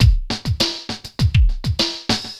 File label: drloop, drumloops, Vinyl Attack; Masterbits